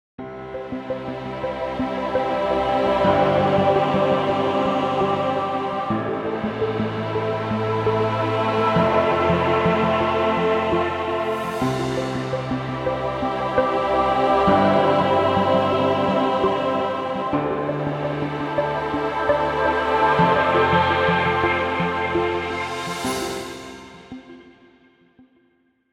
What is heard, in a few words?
Atmospheric Ambient (Relax Music)